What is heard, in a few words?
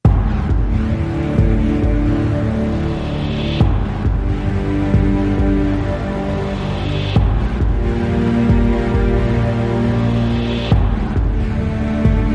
No Choir